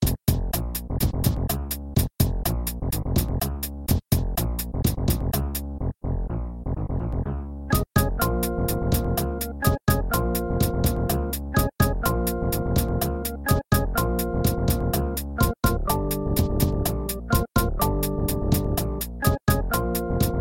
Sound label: Level Completed; super noah s ark 3d; snes